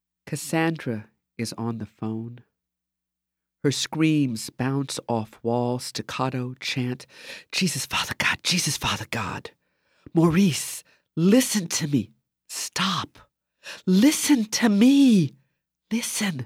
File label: prison chant; devorah major